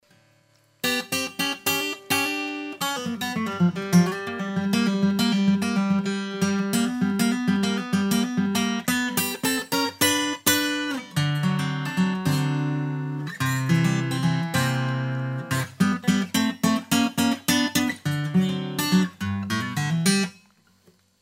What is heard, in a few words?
country